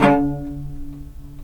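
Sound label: strings, low marcato; String Master Series; Combos 1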